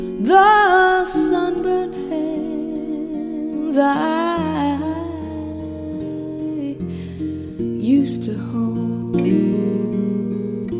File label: acoustic